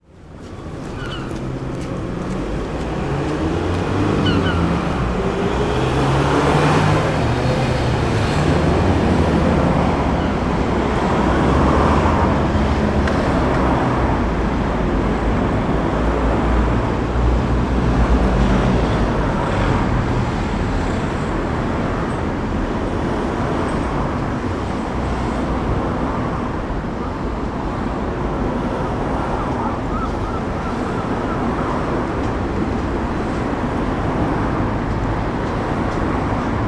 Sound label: transport traffic go at green light town seaguls